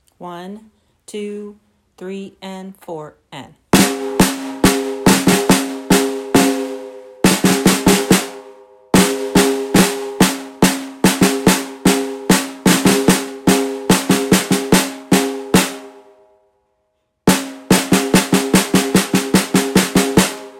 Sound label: percussion